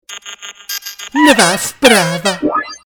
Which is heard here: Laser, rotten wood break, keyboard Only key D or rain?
Laser